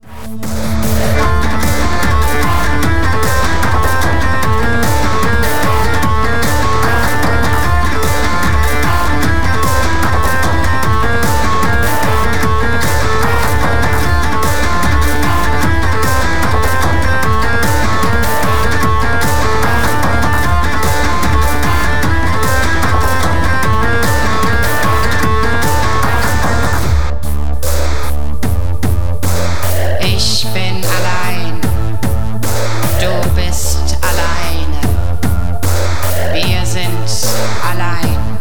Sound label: TR-07 BIPOLAR DISTORTION